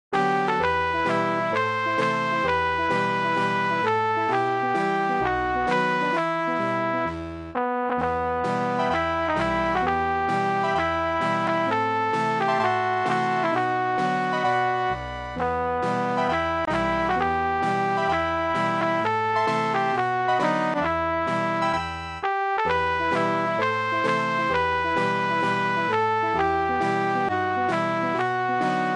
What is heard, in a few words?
brass